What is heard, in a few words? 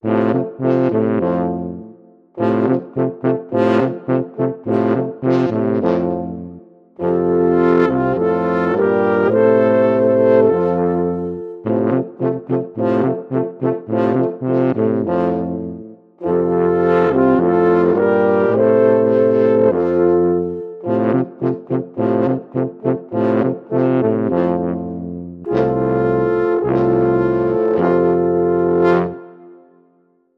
Alphorn